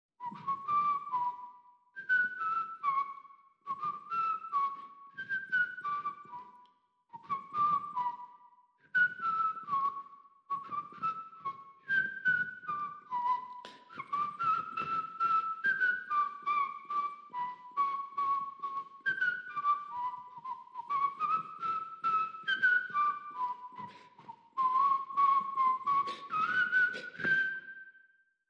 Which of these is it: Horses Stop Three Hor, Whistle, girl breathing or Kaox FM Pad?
Whistle